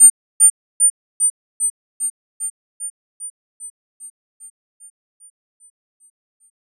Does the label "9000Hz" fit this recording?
Yes